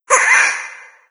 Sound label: pain, cherub; doom3